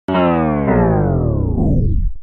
stop

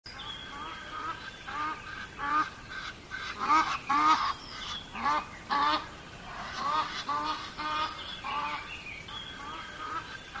Addax; تابیده شاخ

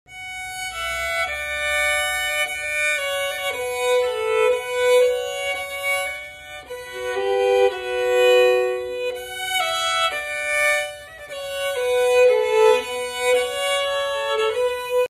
Fiddle

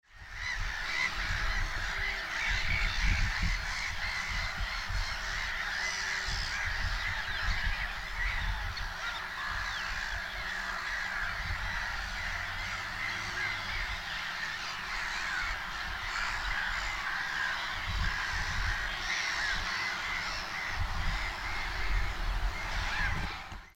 Black headed gull; پرنده کاکایی سرسیاه کوچک

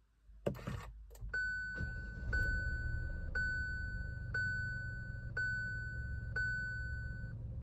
Kia Sonet; ماشین

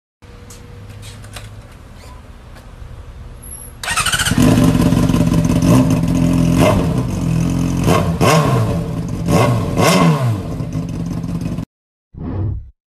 cbr 100; موتور

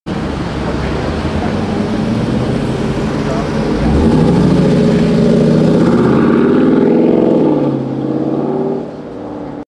Jeep Grand Cherokee

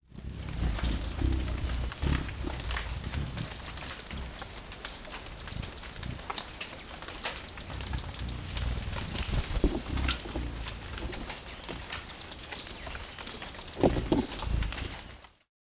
Buff tailed coronet; پرنده تاجک دمنخودی

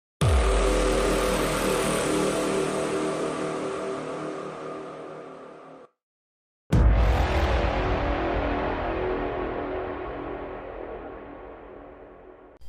Dropping

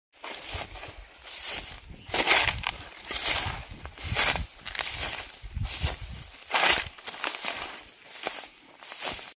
Walking on wood

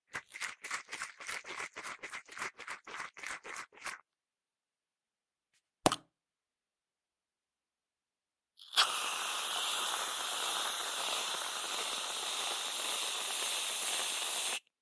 cream; خامه فرم گرفته

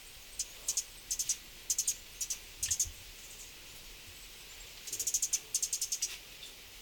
Cuban emerald

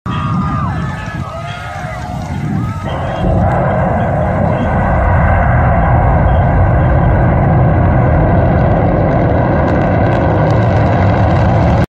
Supernova, انفجار ابرنواختر

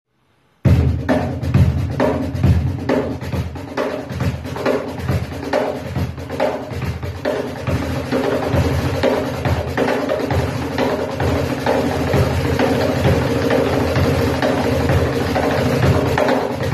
Cajon; ساز کاخن

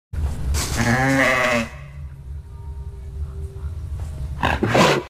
Bighorn sheep; قوچ میش کوهی

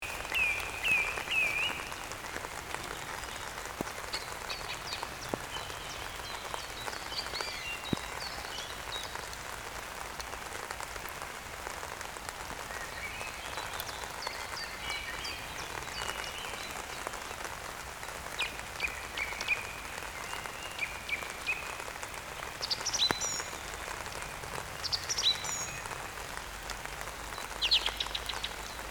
birds singing

